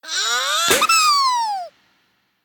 wild explode; goldentavern

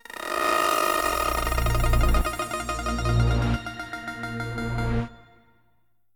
lucky Reel Spin; jewel fruits

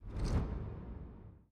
spin loop start; rise of maya

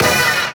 bet Level; mega joker

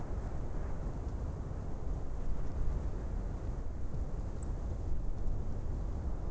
ambience Oct Up; willyshotchillies

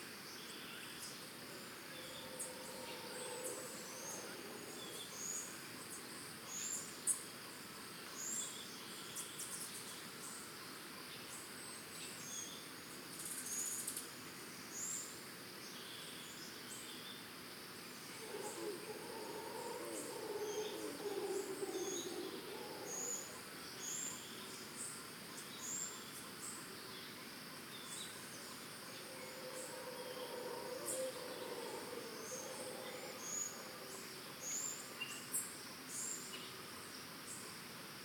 ambience Tropical; templeofnudges

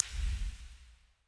spin Button Click; jewel fruits

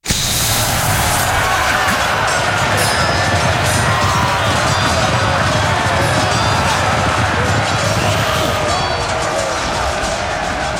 scatter Battle Result; warlords

